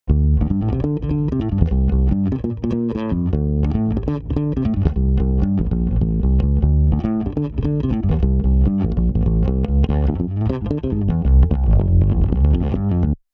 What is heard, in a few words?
E Bass comp; Cameleon Labs